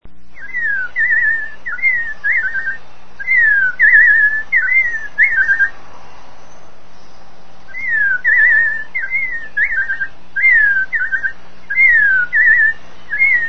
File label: Pajaros BIRD